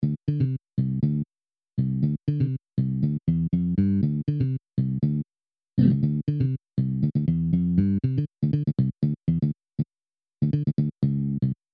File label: bass